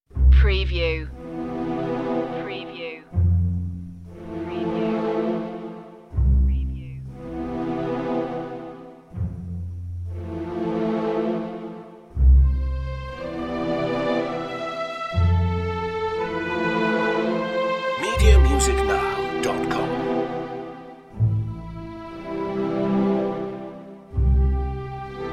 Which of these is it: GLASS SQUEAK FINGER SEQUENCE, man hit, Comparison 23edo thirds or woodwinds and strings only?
woodwinds and strings only